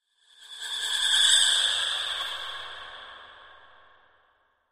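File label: Female Shrill Scream; scary voices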